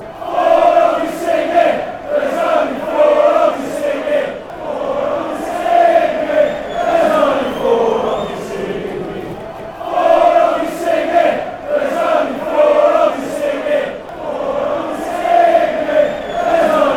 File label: singing, fanchants